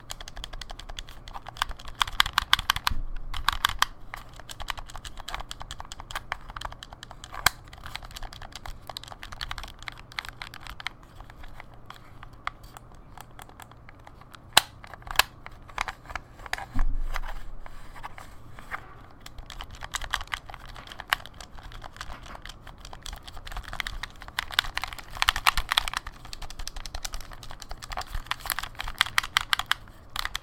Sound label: Video Game Controller Button Pressing